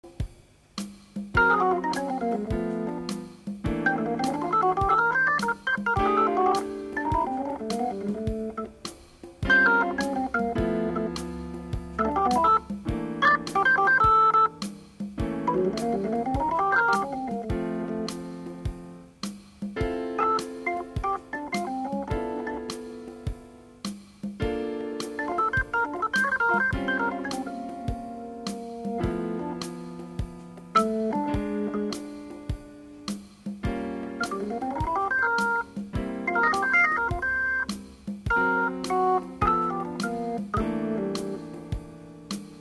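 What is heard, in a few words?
org, orgues Hammond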